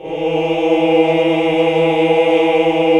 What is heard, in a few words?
OOH, Hallelujah